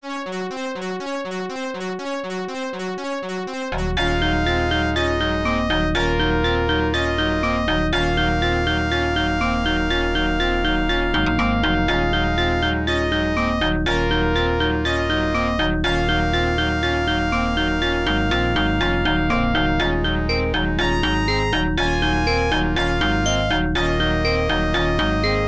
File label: All Clear; Black Panther; arcade